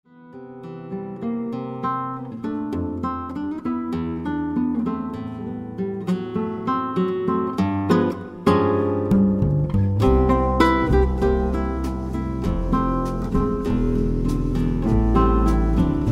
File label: Jazz Trio